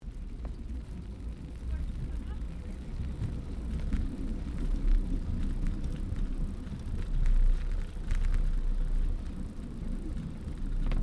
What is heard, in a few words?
Bicycle Riding Wooden Bridge Slowly Braking Stereo, 21 speed road bicycle; Giant Crx3; Bicycles